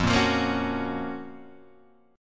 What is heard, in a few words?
Gb Major 7th F, guitar